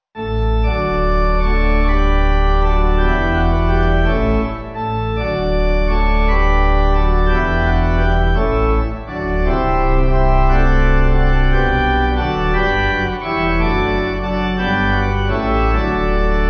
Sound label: Pipe